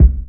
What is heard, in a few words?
classic hip hop kick; Classic Hip Hop Mega Kit